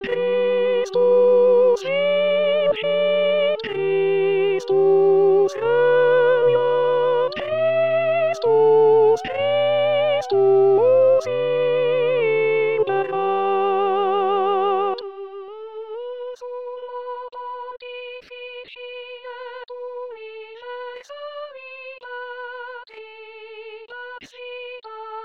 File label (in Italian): Christus Vincit Tenori